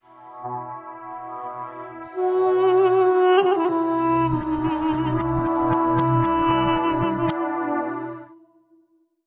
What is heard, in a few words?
Link Sting